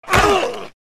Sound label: American football tackle grunt